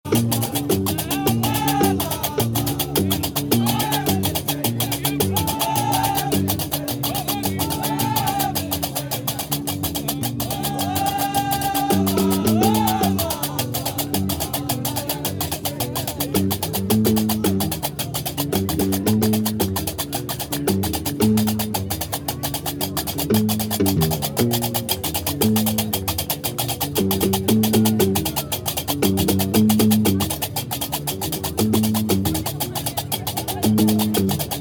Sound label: Marrakech Jamaa El Fna Musicians; zoom h2